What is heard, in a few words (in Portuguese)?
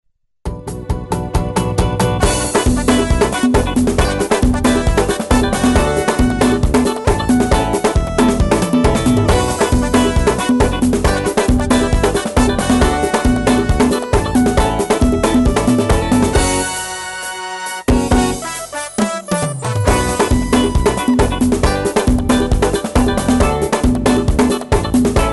frevo axé